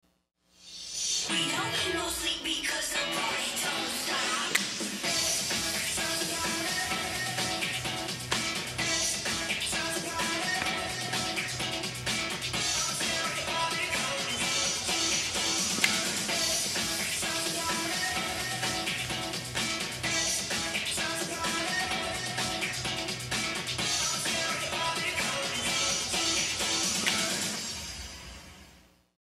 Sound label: tryout music